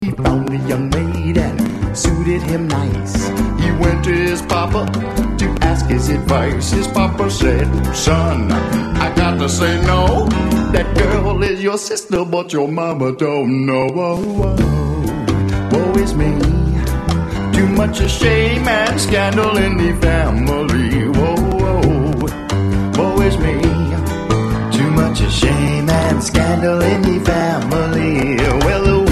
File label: SWING